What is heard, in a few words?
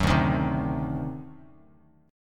D 7th Flat 9th D down Bass Standard; D7b9 strummed; JGuitar